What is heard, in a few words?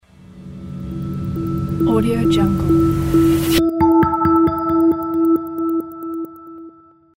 rising modern logo; vintervarg